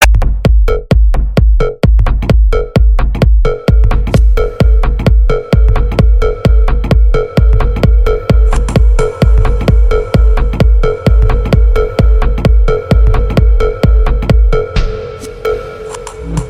MINIMAL TECHNO

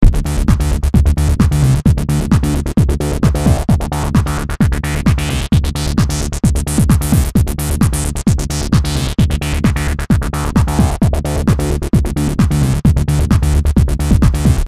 Dance Beats

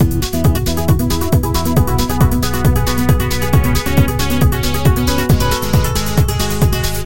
Trance; SampleTone

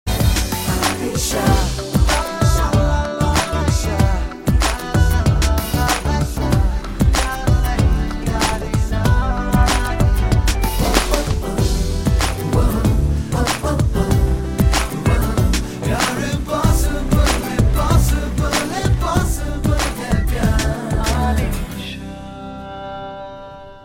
Instrumental

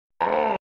death; donkey kong

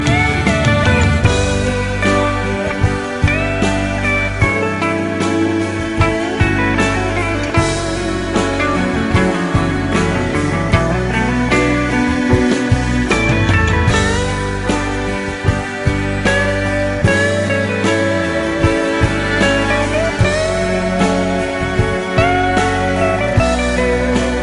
Romantic Guitar

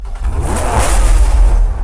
911 Gt3 Rs Startup

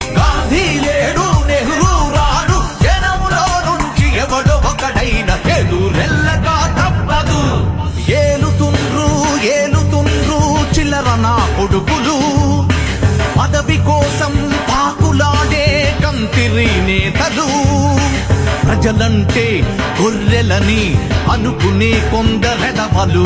Telugu Ringtone

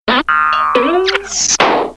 Cartoon Sounds

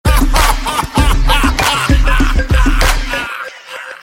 Laugh Sms; Mankatha

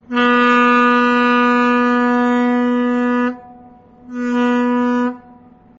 Steamship

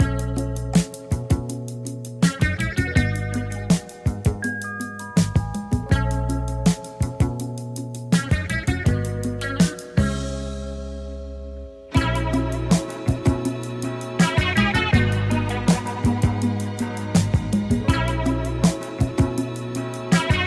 Loop

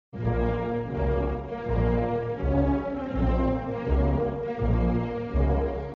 Funeral March

